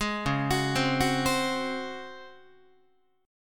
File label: Db Major 7th Suspended 4th, Guitar; arpeggiated